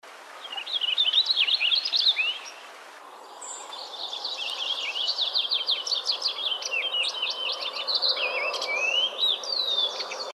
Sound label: FINCH PURPLE; species/birds; wbba